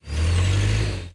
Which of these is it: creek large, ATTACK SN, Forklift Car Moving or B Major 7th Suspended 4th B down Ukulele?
Forklift Car Moving